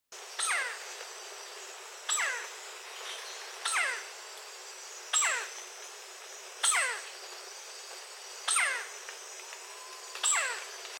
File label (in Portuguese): canto do pica pau de barriga vermelha